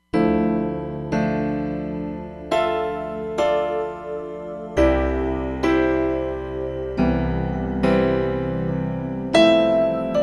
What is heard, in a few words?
Piano, Roland Fantom XR